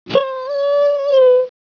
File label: CRYBABY; GUBBLE